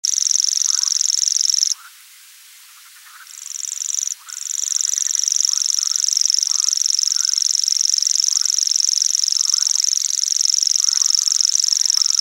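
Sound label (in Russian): sverchok obykn, common cricket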